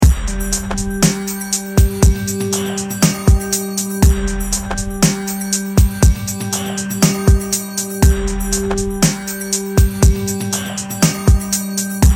Drone Beat, Here’s a G drone with a beat you can use to explore each mode; bass; sustained G; a bassist's guide to modes